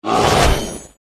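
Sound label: Bear attack Up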